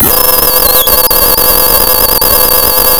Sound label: Sound of tearing paper, center